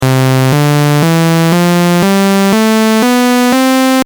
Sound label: saw